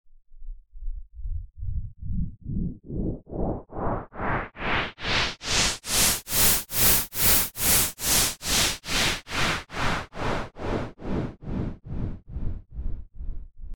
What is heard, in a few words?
Pink Noise Effix Delayed; Techno